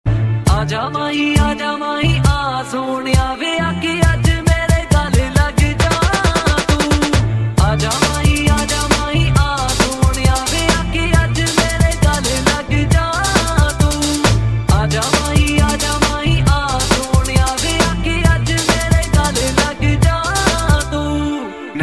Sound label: Remix